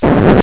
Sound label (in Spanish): disco rallado; Atomix VirtualDJ 8 Pro